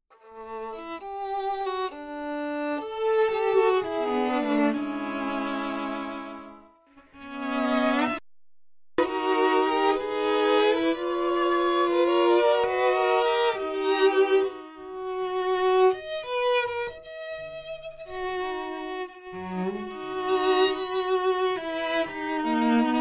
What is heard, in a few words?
QUARTET